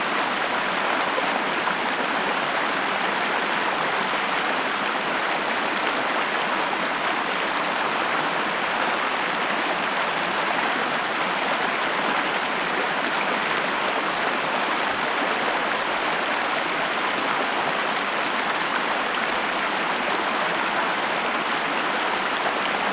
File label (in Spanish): VADEO AGUA MID; SIMUZENSOUND